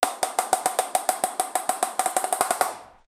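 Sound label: paint ball cannon shooting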